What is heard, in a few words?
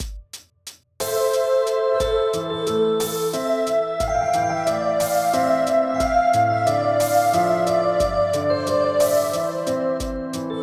keyboard